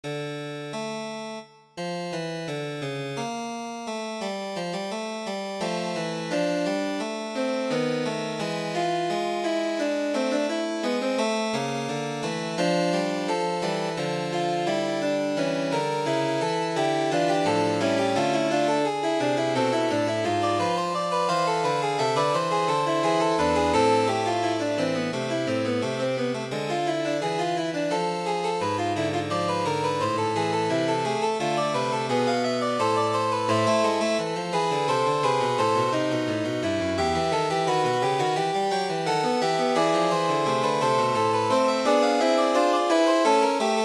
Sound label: FUGA, organ